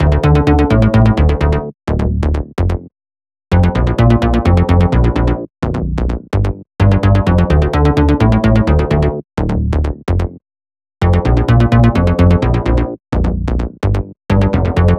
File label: Melody Kits 128BPM Root D; Vengeance Samples